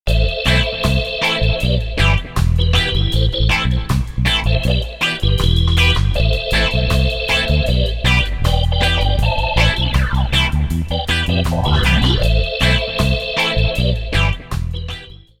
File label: Reggae